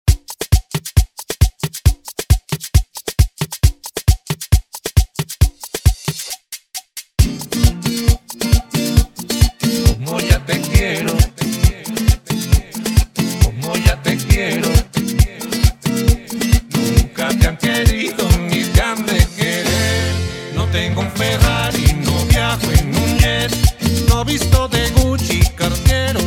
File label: remix intro 135 BPM